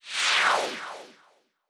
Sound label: symbol In; netent